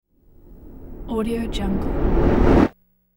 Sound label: reverse whoosh stinger